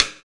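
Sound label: PER PROCU, Procussion, percussion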